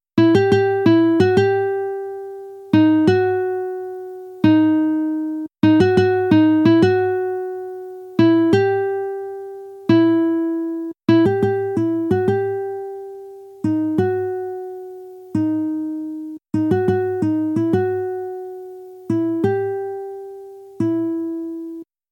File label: alto